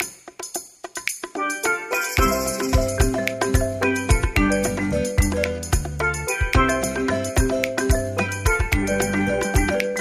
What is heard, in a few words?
music cheerful; bgm